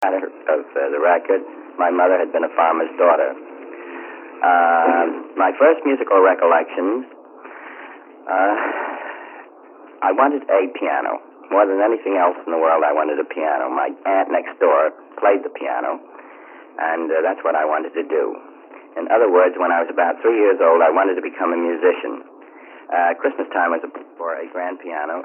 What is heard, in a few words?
ToyPiano